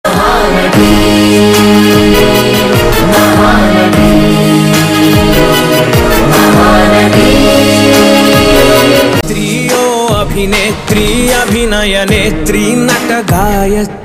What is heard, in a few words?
Title Song Ringtone